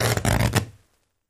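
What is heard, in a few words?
Electric Arc Short Circuit; arcs & sparks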